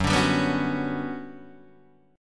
G Major Sharp 11th Gb, chord

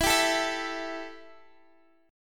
E Minor Double Flat 5th E Guitar Open D

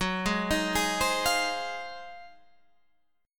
Ab 13th Gb

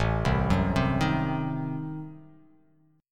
A Diminished 7th A down

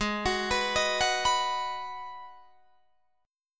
G sharp Minor 7th G sharp, Guitar Open D

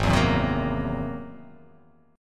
G sharp Augmented 9th G sharp

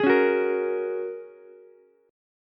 A sharp Minor 7th Sharp 5th, Ukulele; strummed; 13,13,14,13; JGuitar

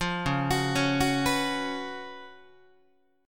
C sharp 11th Guitar, C#11 chord; arpeggiated; JGuitar

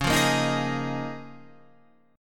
Db 6th Add 9th Db down; Db6add9; strummed

